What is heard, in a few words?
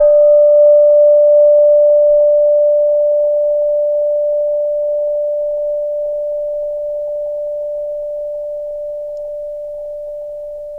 chime; Saturn